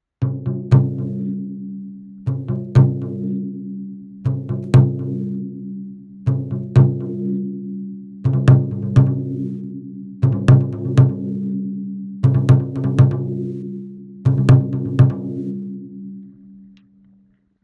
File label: Taiko